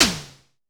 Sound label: Drum X Snare; Pearl Drum-X